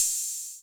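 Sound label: Open Hi-Hat 808 Color B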